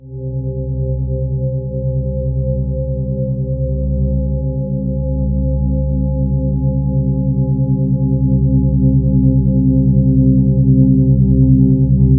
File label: abyss, ominous drones; USB Soundscan vol.48; AKAI